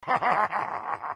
hahahahahahaha

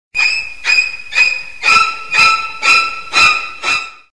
psycho violin screech

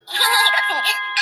cute giggle; pucca

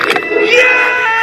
screaming; mike wazowski

